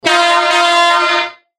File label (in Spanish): tono